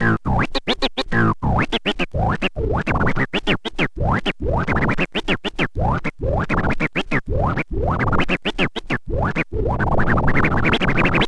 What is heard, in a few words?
scratch long, Vinyl Attack, scratches